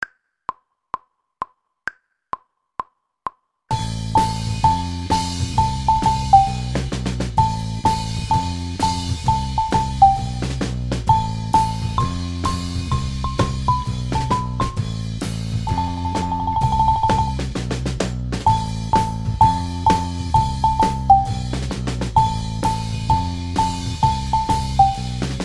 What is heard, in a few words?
tuned percussion